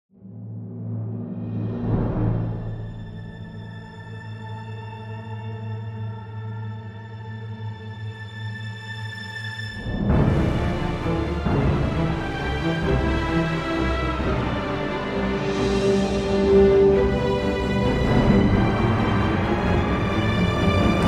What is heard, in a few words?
Game Over